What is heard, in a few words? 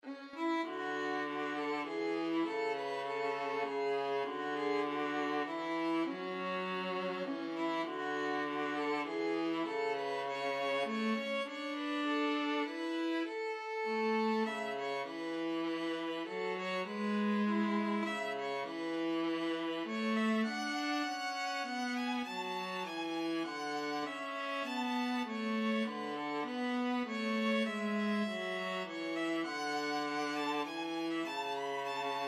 violin viola duet